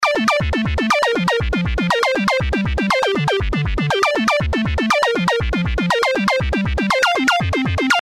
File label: music